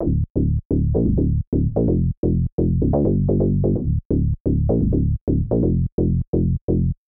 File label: bass; whisper